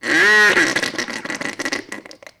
Bronx Cheer; Vintage Cartoon; Comedy Vocals

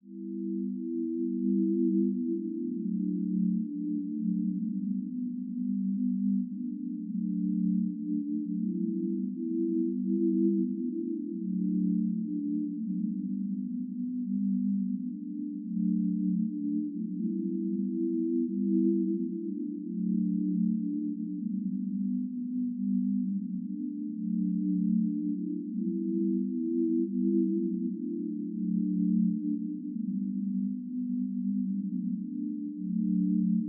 114 BPM E Min Pad; Loop Stems; Cymatics; Obsessions

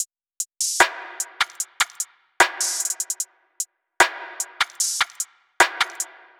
Top Loop - 150 BPM, Drum Loops; Cymatics; Cobra Hip Hop Sample Pack

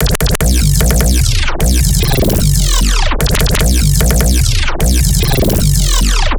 Bass Loop, Dubstep, 150bpm; X Monsterloop; Ghosthack; Dubstep Sound Arsenal

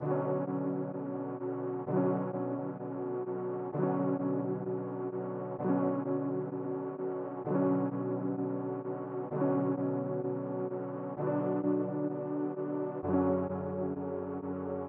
Various Melody Loop - 129 BPM F# Min Melody; Melodies; Loop Stems; Cymatics; Oracle Sample Pack; Oracle Various Melody Loop 7

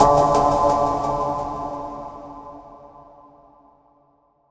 Fx 130, Techno; Techno Sounds 2024

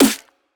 Snare, Dubstep Snares

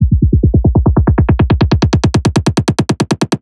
Drum Roll 140; Drums & Percs; Ghosthack; Rolls

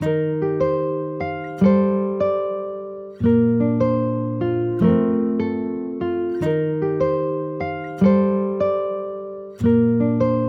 150 BPM G Min Chords, Guitar; Loop Stems; Cymatics

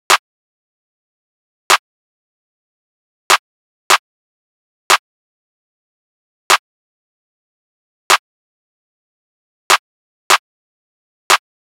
Drum Loop 150 BPM Clap; Drum Loops; Loop Stems; Cymatics; Mystery Pack Vol 4; Konopio Drum Loop

